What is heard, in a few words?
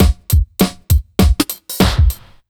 100 BEAT, Underground Hip Hop; USB Soundscan vol.02; AKAI